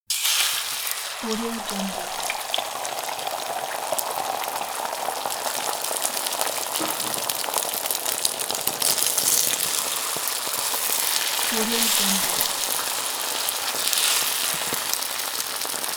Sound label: eggs cooking